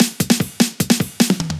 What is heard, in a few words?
Dubstep Fill, 150bpm; Ghosthack; Dubstep Sound Arsenal